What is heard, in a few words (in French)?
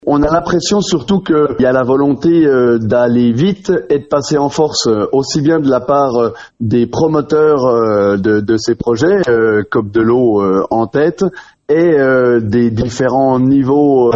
manif bassines; L’appel à une forte mobilisation contre le projet de méga-bassine à Sainte-Soline